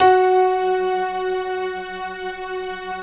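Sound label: bright electric piano strings; Optical Media International - Sonic Images Library; Mellopia Str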